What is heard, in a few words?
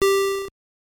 Test Your Skill Phase (Coin Tally); Mario Bros.; NES